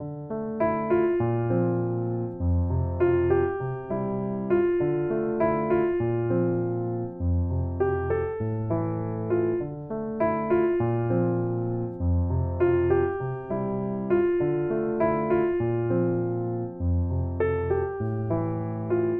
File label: Piano Arpeggio Dm 100; Sample Banks; Melody; Keyboards